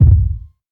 reverb steel kick drum e key, kick drum sound tuned to the E note; kick drum samples